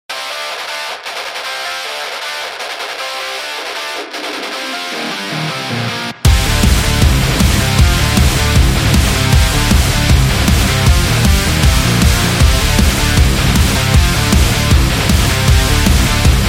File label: Metal